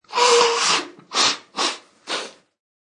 Nose Blow, Blowing of a nose.; Sniffling; Human

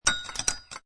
Stirring In A Cup With A Tea Spoon; Drink Mixing; Lynne Music

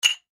household glasses tumblers x2 clink; Drinking Glasses (X2) Clink Together

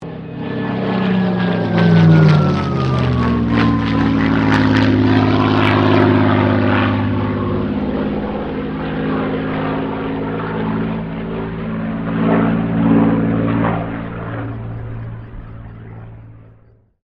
AIRCRAFT SUPERMARINE SPITFIRE MK-XVI PASS

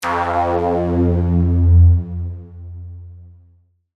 Multimedia Chime, Technology, Multimedia, Chime; Chime Sound Effects; Chimes (Sound Effects:Multimedia); BlastWave

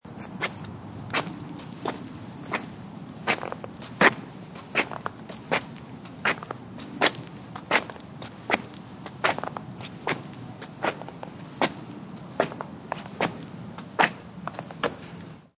Sneaker footsteps on concrete; Sound Effects:Human

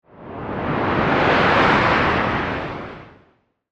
Ghost Pass By, Spiritual, Movement, Whoosh; Horror; Ghost Sound Effects; BlastWave

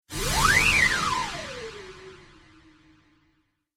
Whistle Whoosh

